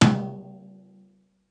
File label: floor tom press